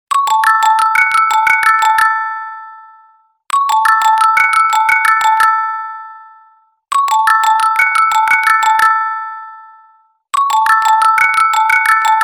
iphone

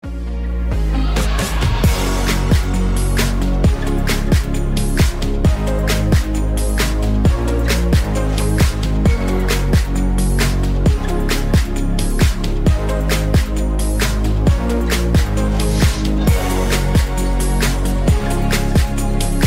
instrumental

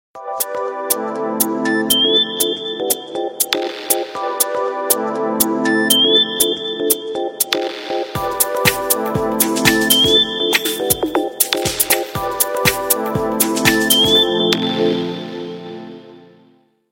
nokia 5300 glow

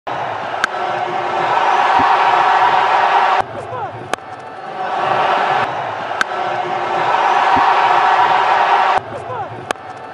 cricket bat

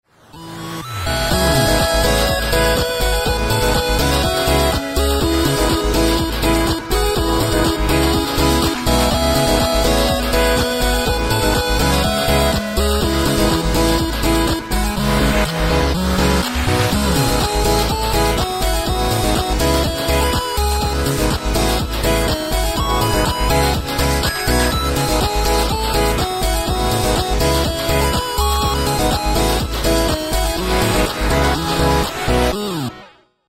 8 bit

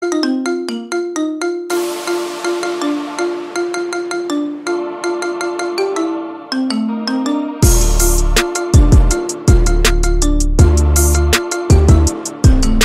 marimba

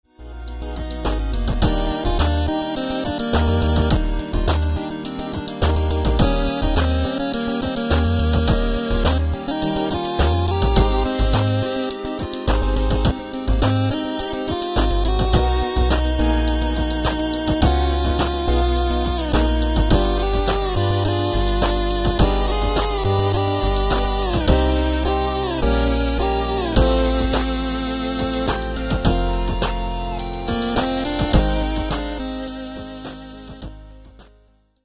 8 bit

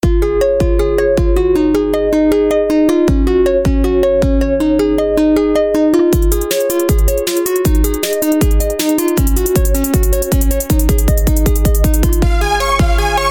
xiaomi classic bass mix

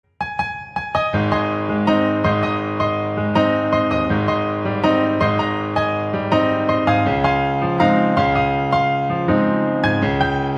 piano

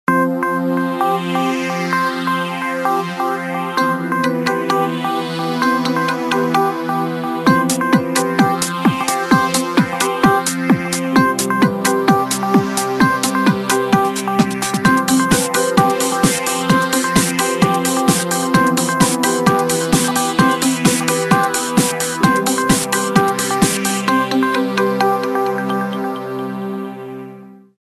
nokia n91

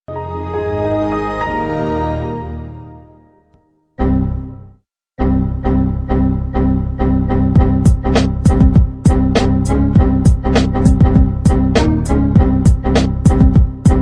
window xp remix

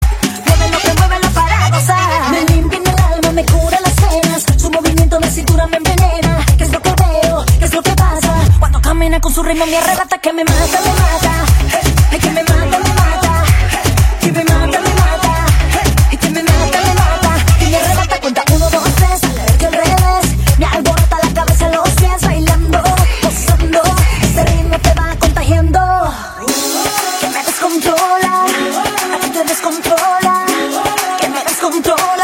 melody

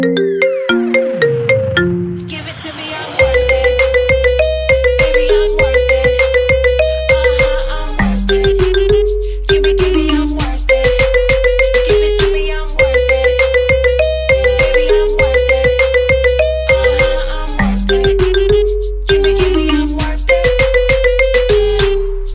marimba

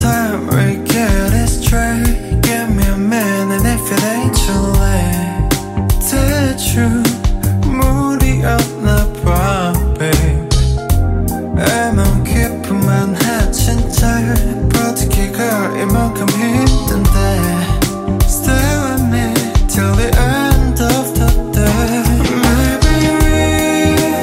slow dancing

